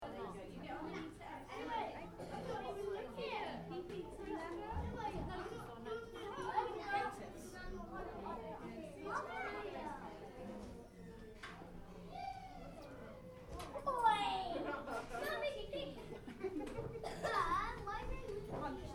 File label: Party, Children, Small: Lunch Ambience; Interior kids party lunch; Cafeterias; Stuart Duffield